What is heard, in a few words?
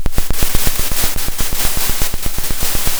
Sound of a drum roll